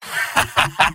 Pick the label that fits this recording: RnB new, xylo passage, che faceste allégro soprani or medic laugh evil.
medic laugh evil